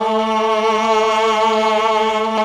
cho a2, Choir, MELLOTRON; Retro Sampler; Choir + Flute

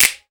FINGER POPS; AKAI S-Series CD-ROM Sound Library VOL-5

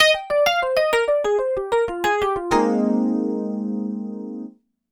EP, Keyboards, Electric Pianos

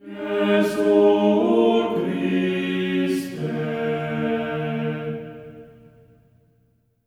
JESU D#4, Symphony of Voices; Spectrasonics

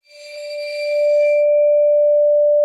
ARCO D5, VIBES; Xsample VOL-4

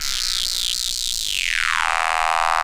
FX; AMG Megabass REMIX! VOL-2

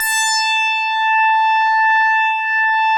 MINIMOOG

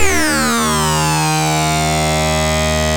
ARP Synths, RUMBLE REZ D1; E-MU Formula 4000 Series Vol. 3; Analog Odyssey

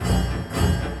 Orchestral Loops; 128 STRAVNSKY

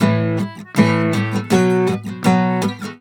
EMGTR; USB Soundscan vol.42; AKAI

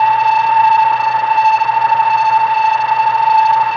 SHAKE A5, Synthy Tonal; Distored Reality

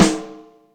Trash RIM, Yamaha DTXpress Acoustic Snare

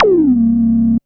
ZAP TOM; Back In Time Records; Big Bang

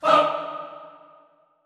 HAH, Classical Choir; Best Service

